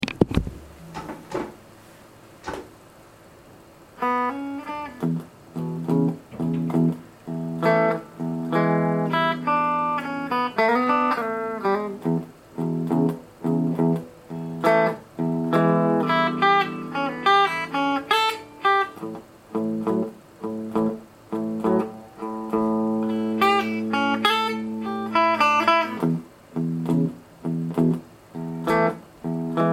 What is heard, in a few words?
solo blues jam in E